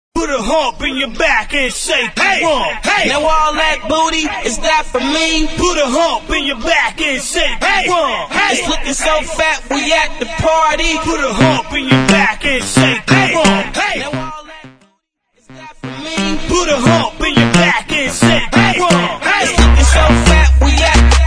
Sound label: Mrs Bubblegum Hype Acap